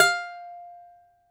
DOMRA, Russian Strings

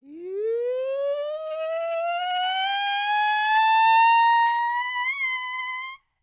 YELP, World Voices, ANDES FX

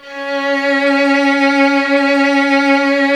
Virtuoso Strings; ILIO; Kirk Hunter Virtuoso Strings VOL-3; 90 s Sample CDs; CHMB STR ESP

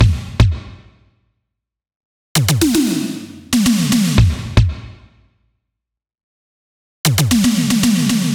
Beat Megatron; Zero-G; Future Wave; Beats

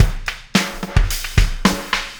Oscillation Beat

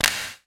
VERB CLAP; E-MU Formula 4000 Series Vol. 3; Analog Odyssey

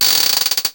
GUIRO; AMG - Vince Clarke Lucky Bastard

